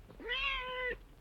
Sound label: Cat moan